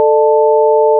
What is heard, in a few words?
test 440 880